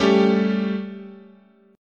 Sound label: G Suspended 2nd Flat 5th, chord, guitar